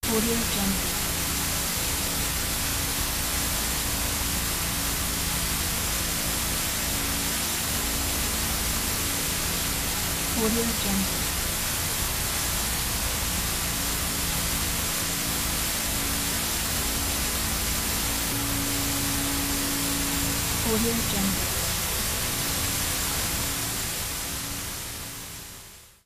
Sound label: static noise